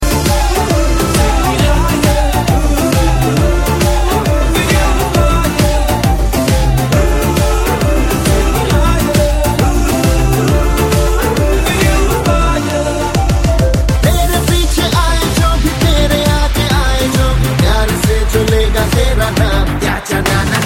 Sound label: Remix